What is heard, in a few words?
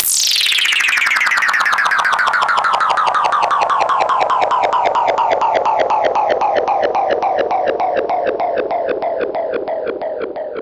Sweeps Down